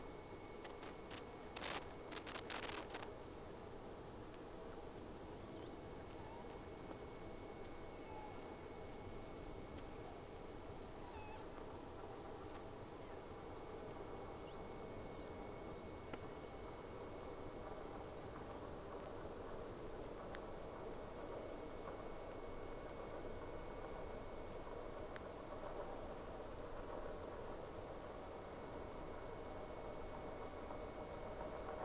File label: Whales; SeaSnake